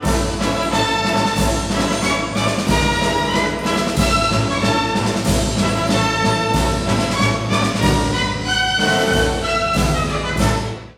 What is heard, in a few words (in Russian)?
Фанфары; Вагнер; Риенци